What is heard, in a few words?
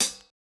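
closed hi-hat; eddyflux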